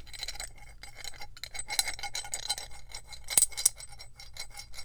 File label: Metal Hinge